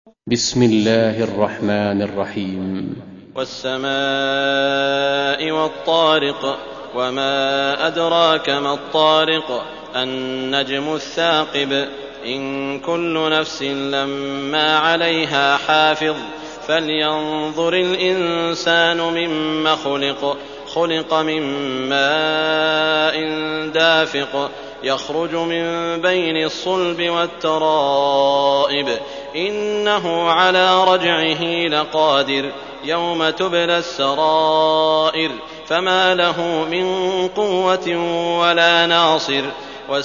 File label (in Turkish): Tarik, kuran; sudeysi sureler